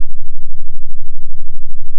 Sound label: fm cello single; manhattan; FFTimbre